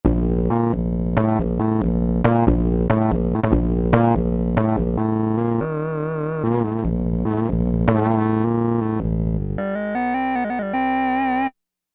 7 bit bass

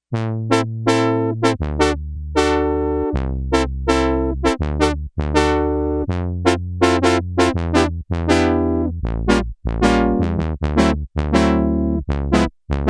BRASS

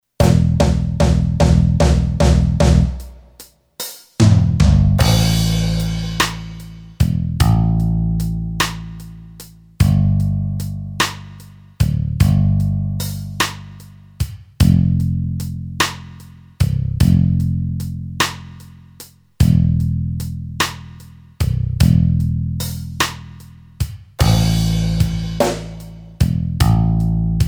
BALLAD, Boss DR-3

